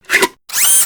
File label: visor up